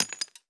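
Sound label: bullet case bounce 50bmg dirt; gmod; viper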